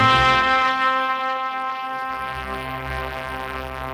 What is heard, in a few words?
victory fanfare